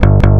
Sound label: 147 Garage Bass Fill G; Dance DJ